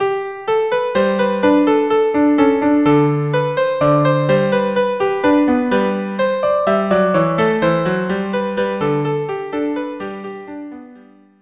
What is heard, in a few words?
viola duo minuet